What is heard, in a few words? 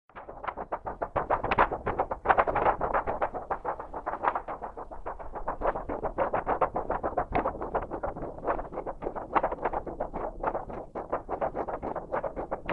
Shaking paper